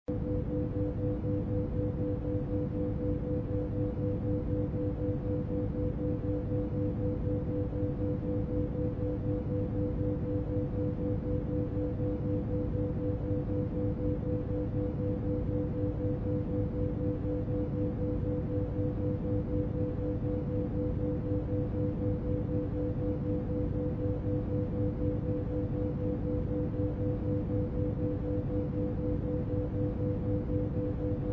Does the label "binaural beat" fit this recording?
Yes